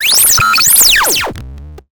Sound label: science fiction radio signal lost